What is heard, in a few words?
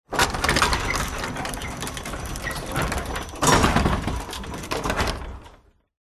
household garage door wooden electric motorised open or close short half